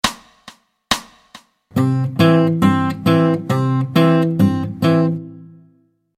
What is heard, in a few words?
Travis Picking